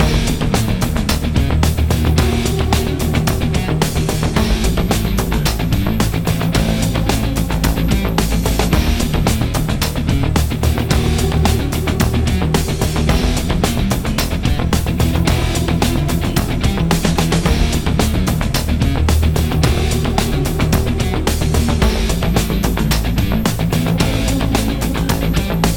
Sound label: BGM Police